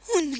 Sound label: mobs hit; server-nalc